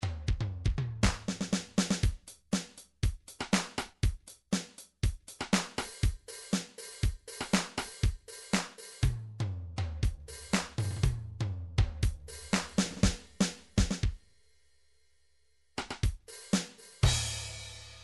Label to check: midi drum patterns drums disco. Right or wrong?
Right